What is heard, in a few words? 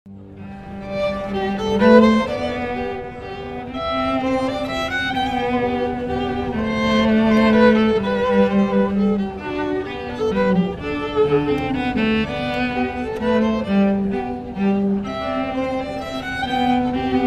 violin cello